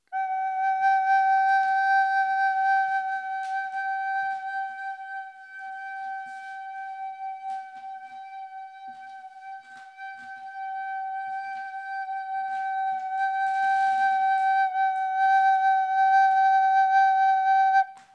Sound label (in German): sm58 umrundung floete